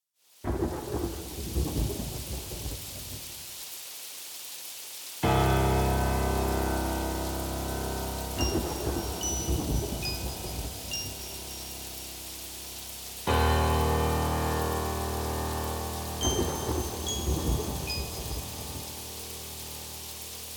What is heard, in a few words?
Instrumental